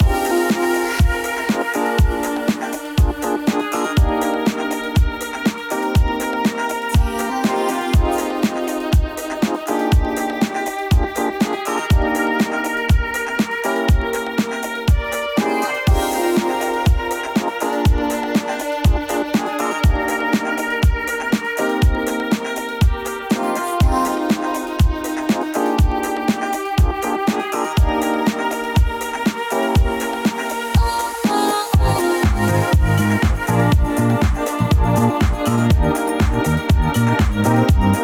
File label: Funky Disk Boss Sax; Popslinger